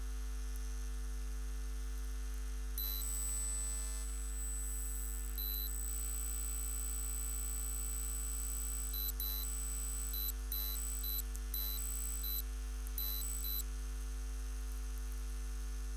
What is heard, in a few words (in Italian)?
disturbo cantiere; lowfreq